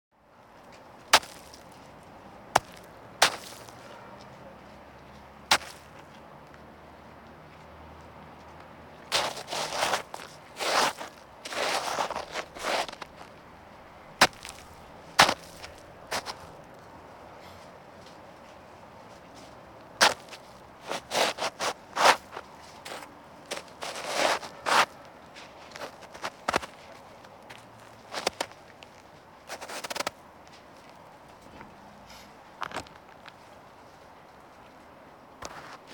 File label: snow kicking; keith de mendonca; The Sound of Snow and Ice